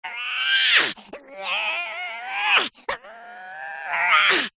cartoon character vocalisation high pitched lifting pushing or struggling